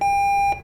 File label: beep, atm